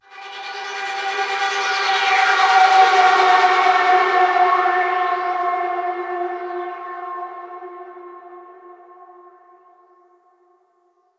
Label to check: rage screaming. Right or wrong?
Wrong